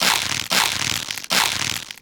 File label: struggle cord; tonate